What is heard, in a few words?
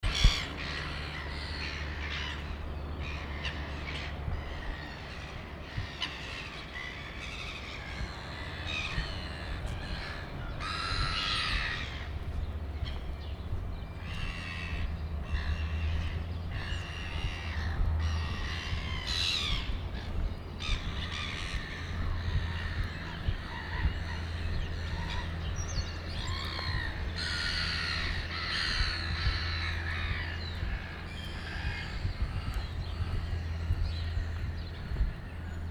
sea gulls to slow port chalmers